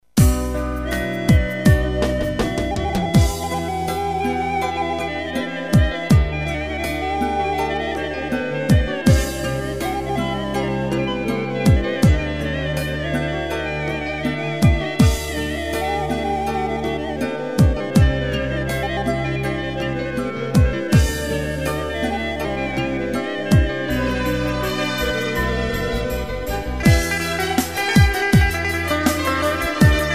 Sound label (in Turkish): pa80